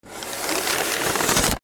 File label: door lock